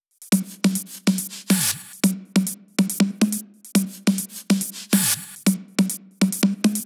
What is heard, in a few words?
drum Loop 140BPM basic perc solo; Vengeance - Essential Clubsounds Vol.5; VEC5 Drumloops; VEC5 Multi Loops 140BPM